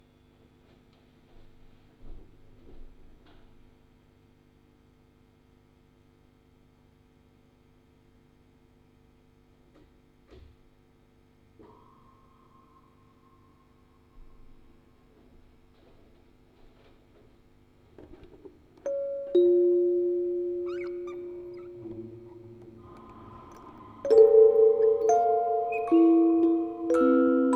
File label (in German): Aufnahme Spieluhren Quartett 4 Kanal stereo